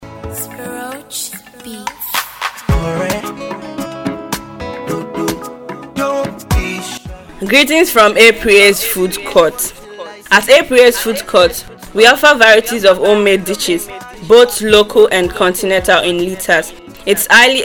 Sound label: Food Court Jingle